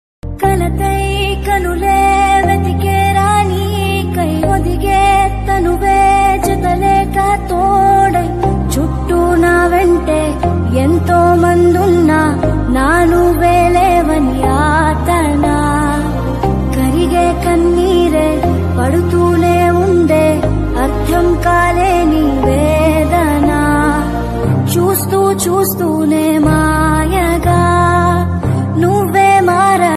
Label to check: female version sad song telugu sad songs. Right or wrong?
Right